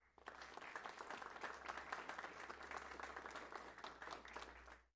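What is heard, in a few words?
Crowd 50 Light Burst Interior; small int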